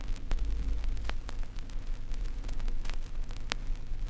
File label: Crackle, Vinyl Noise